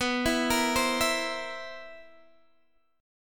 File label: C 7th B, chord; C7/B; arpeggiated